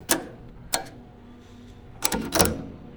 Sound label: vintage mechanical switch toggling clicks